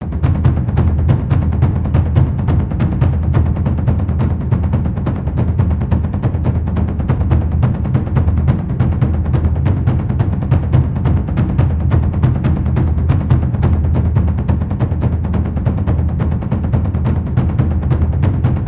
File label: loop layer taiko